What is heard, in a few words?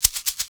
Percussion Loops, Shaker & Tamb; Houseworx